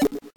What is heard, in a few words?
grab; shweets sim; snivy